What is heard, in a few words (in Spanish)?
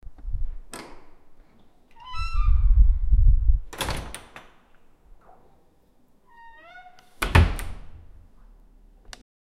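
sonido de una puerta grande, exterior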